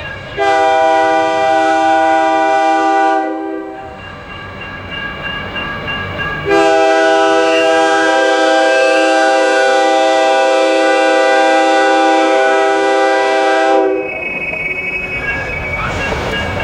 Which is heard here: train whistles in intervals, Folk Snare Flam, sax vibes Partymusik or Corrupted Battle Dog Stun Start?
train whistles in intervals